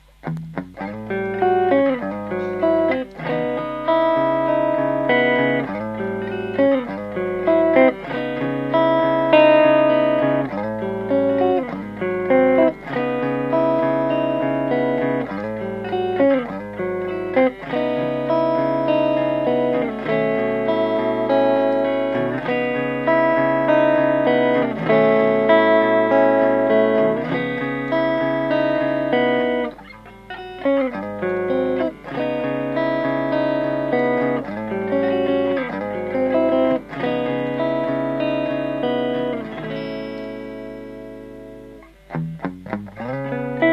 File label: 335 drive